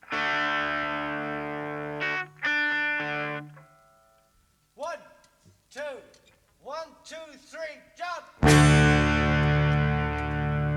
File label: Instrumental